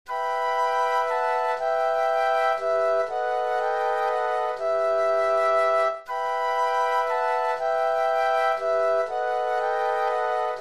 Flute Quartet; christmas

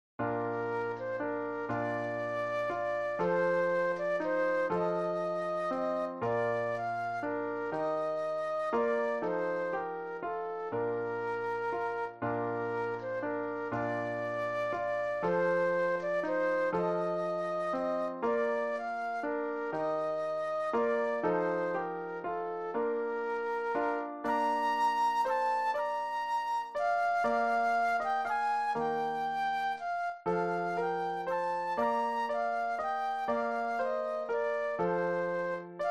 Lilliburlero Flute Solo; classical; Purcell

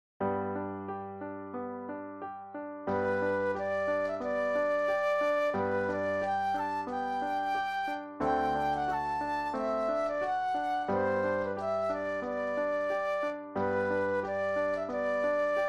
Aria Flute Solo; classical; Flotow; Lionels

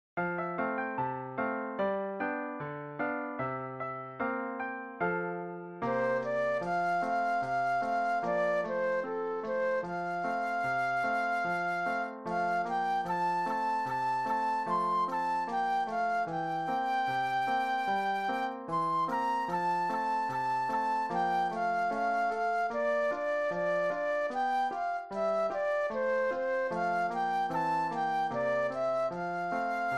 Flute Solo; She'll Be Coming Round the Mountain

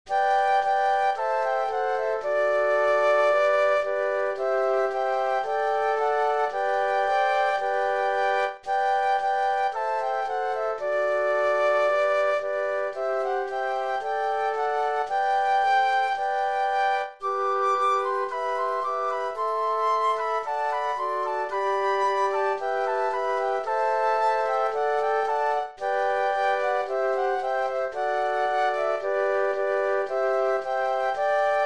Flute Quartet; christmas

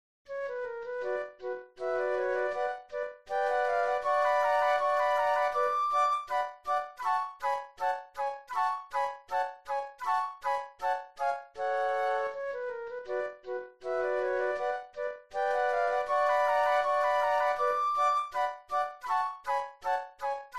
Rondo alla Turca Theme Flute Trio; classical; Mozart

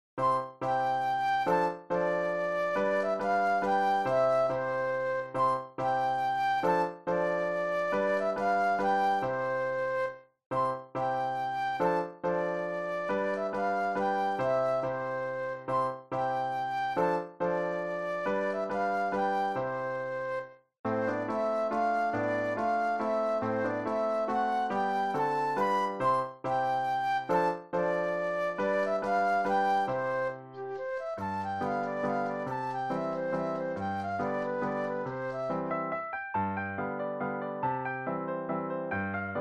Waltz Flute Solo; classical; Weber

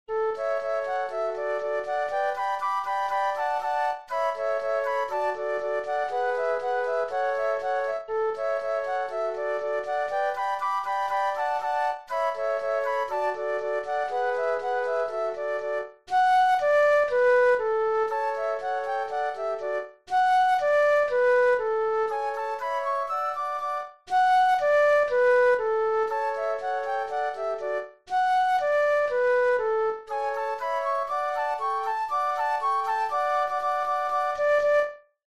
Can Can Flute Trio; classical; Offenbach

